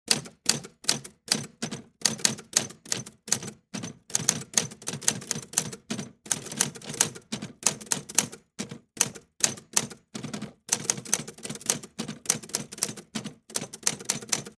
Typewriter Type, Technology, Typewriter, Smith, Corona, Type; Typing Sound Effects; BlastWave